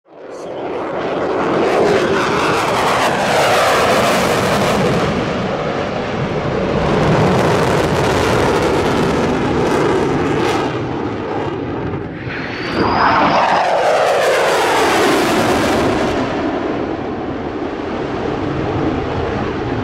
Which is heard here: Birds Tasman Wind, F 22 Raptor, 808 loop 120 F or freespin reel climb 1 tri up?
F 22 Raptor